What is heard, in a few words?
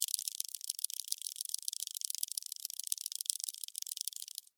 Insect Run Cartoon, Bug; Animal; Insect Movement